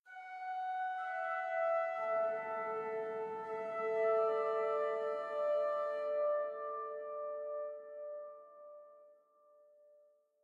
Organ High

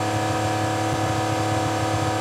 Veneno Low

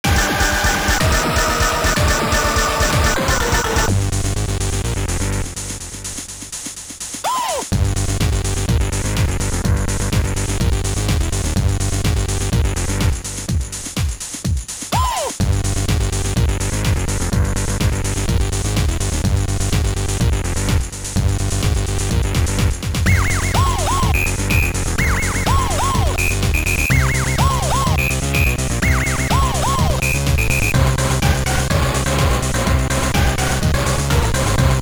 Techno Party; apidya; amiga

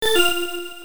Correct; quiz marugoto the world 2 time machine ni onegai pc engine cd

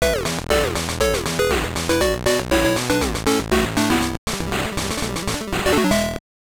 Jingle; NHL Blades of Steel; GBC

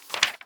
Turning Paper Page; this is the zodiac speaking 2020 gamerip switch ps4 xbox one pc macos

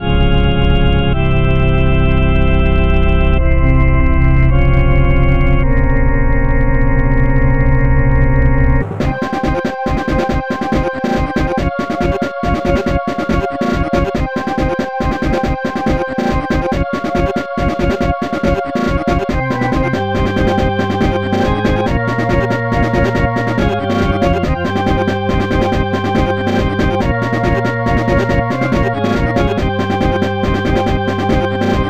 without reverb; Kingdom Hearts Chain of Memories Final Mix HD; Graceful Assassin

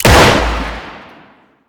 Gun Fire Outside; Devour; The Town

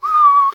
Ninjastar windup

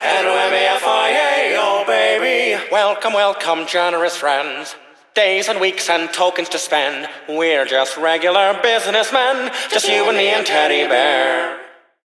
Vox Only; Sam & Max Save the World Remastered; Casino Bears

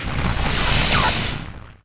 Poison

